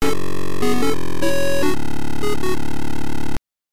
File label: Lose Life; Hudson Hawk; game boy